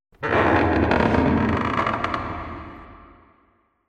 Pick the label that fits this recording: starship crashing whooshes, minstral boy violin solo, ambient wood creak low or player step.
ambient wood creak low